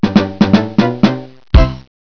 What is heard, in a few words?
sting